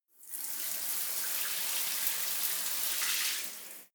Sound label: shower short sequences; haus garten; badezimmer